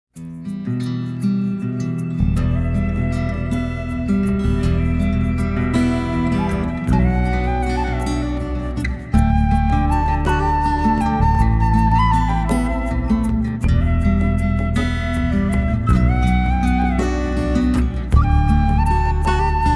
shepards flute

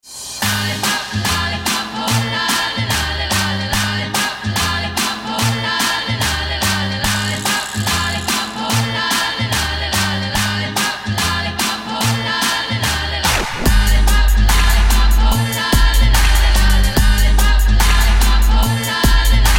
trap remix

